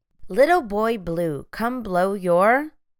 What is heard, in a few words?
horn with blank